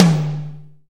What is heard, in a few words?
drum hit clap; osu!